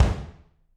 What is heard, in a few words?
BD, Drums; Bob Clearmountain Drums I